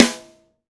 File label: TY Rim f, Roland BK-5, Snares